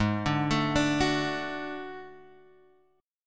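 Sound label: D Major, A flat; D/Ab; arpeggiated; JGuitar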